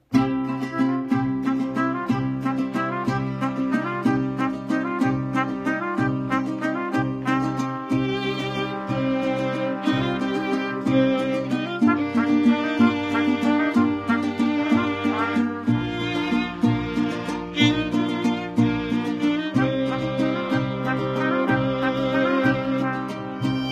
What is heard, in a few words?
Mariachi